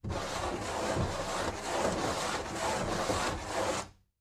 Fingernails Scrape, chalkboard

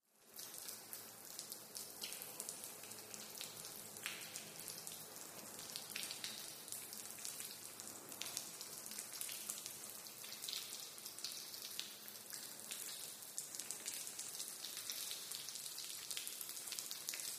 Water Spray Drops, sprays & hoses

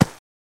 Horse Hoof Thud

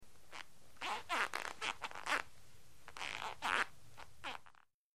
Rubber Squeaks, rubber stretch

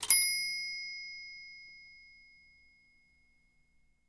Typewriter Margin; typewriters

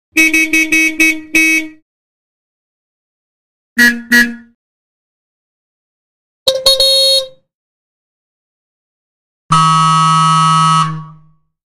Horn 4 Car Horn Honks

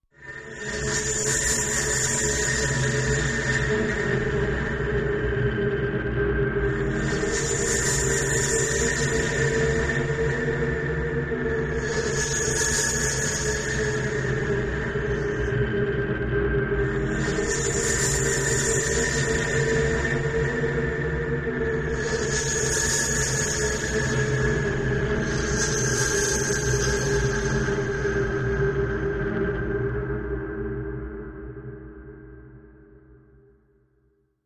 Building Blades High Pi, industrial

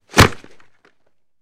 Football Helmet Pla, football

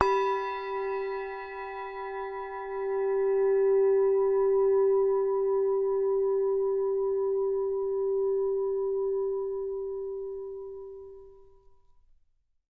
Bowl Lrg Strike, singing bowls

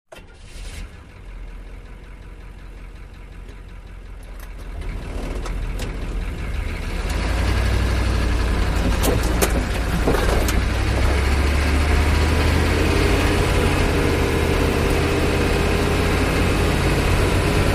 Humvee On Board Steady With Interior Movement

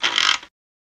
Typewriter Old Carr; typewriters

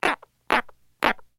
Frog Australian Tree

